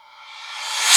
PERC

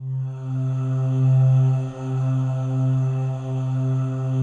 PAD, ATMO PADS; Best Service; XX Large

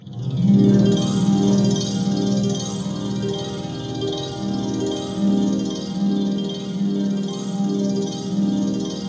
ATMOPAD; Best Service; XX Large; Pads

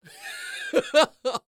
LAUGH

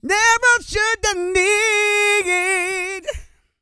SING

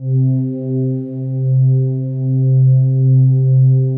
PAD, ATMO PADS; Best Service; XX Large

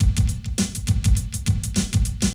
BREAK

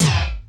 TOM

